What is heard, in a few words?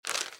ambience room shadow room working layer